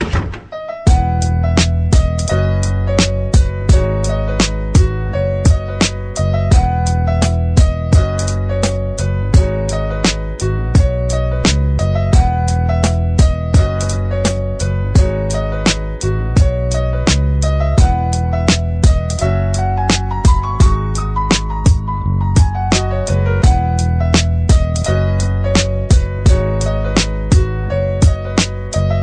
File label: piano hip-hop remix